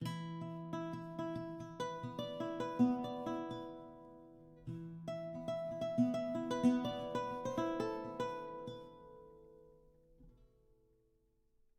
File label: baroque guitar